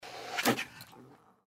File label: gecko claw atk vox; npc